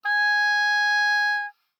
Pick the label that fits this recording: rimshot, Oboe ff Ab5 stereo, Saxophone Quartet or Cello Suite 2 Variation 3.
Oboe ff Ab5 stereo